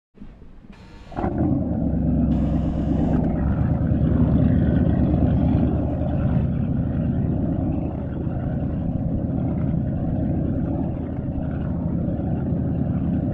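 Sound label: motor boat starts, moves, inside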